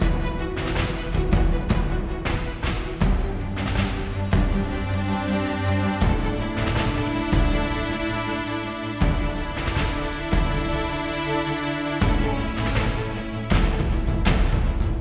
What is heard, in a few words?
Mood Mid